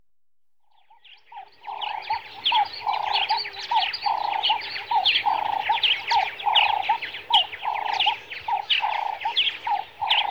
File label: Kenya, Birds Early Morning, Africa, Natural World; BBC Sound Effects Library